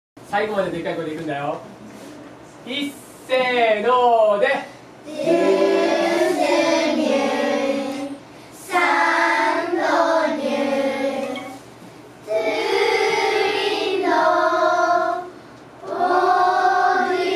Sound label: Douce Nuit; Sounds of Yufuin; Christmas Camp